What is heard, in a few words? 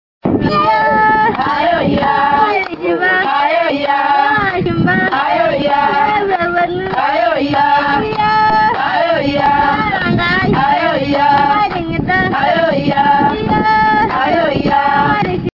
Masai ladies